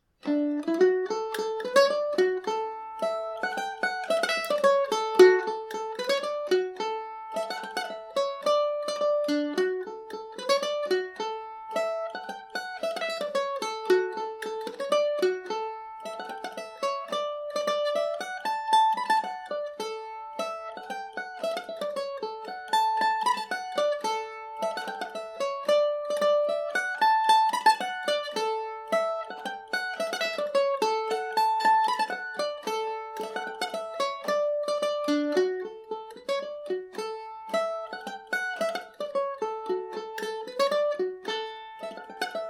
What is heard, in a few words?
polka, mandolin; orchard county; G&O #34 mandolin